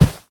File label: Carpet; devour 2021 gamerip pc macos